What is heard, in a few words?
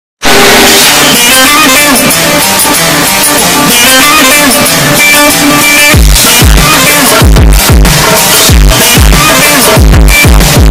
earape hard bass; recep ivedik